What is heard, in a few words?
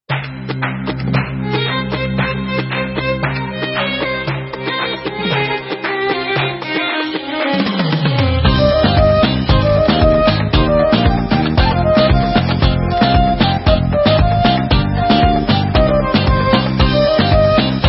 Debka, Instrumental